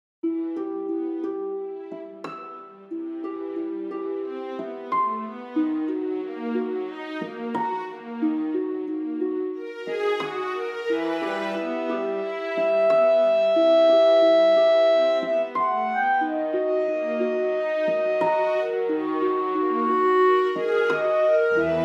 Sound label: orchestral; native instruments; kontakt3